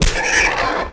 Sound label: hit pig; animal; Tony Hawk's Project 8; XBOX